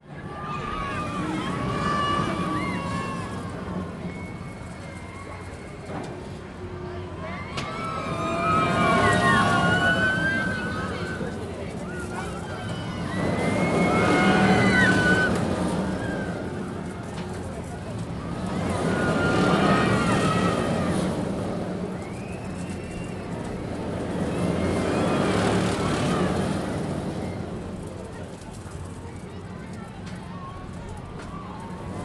fair ride the rainbow start swirl decelerate stop some voices; fvtt sonniss gdc audio